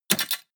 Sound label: mechanical lever metal small down